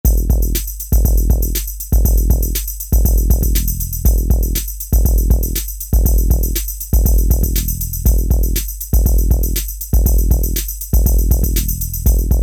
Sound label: Rap Hip Hop